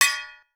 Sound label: TRASHY METAL, Definitive Percussion